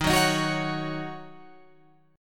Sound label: D sharp Minor 7th, Guitar, Open F; strummed